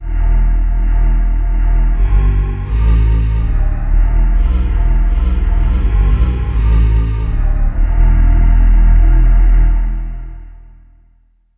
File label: synth voice bass